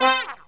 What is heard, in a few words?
SYNTH GENERAL; Megabass Remix! 2